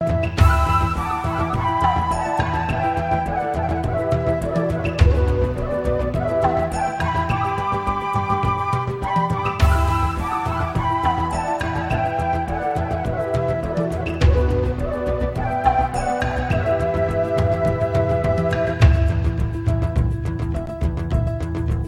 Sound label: flute; زنگ موبایل